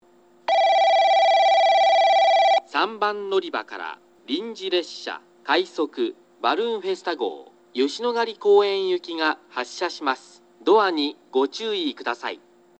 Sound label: balloon hizenyamaguchi rapid yoshinogari koen, portable recording